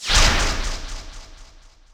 Fence Delete; gamerip

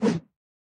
Bollard Lower Whoosh; Going Under

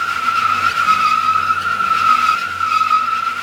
Tire Squeal Loop; Joblin Oober

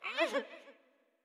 Wimp Hover

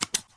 Scissors Snip; lethal company

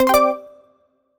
Toy Baseball Strike Foul Ball; Switch 2

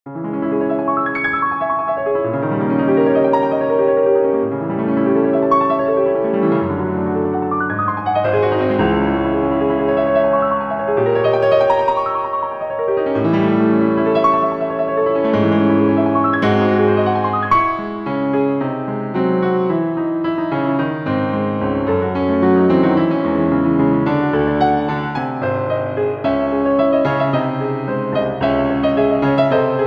Forest Piano; bloodrayne betrayal fresh bites

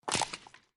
Drop Bone; Lethal Company

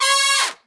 X P Level Up Horns; subway surfers city sound effects; Android, iOS

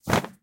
Fly out jump; Mamepato

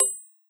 Tower Crit

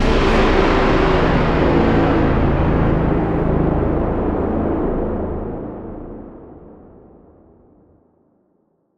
MUS Outro Negative; extinction deluxe edition; ps4 windows xbox one

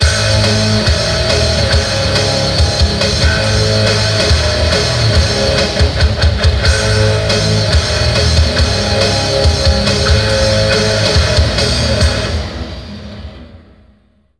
Ditty; nfl gameday 2004 ps2 gamerip 2003

